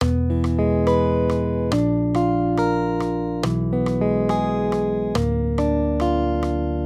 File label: maj triads; E-Bb; accompaniment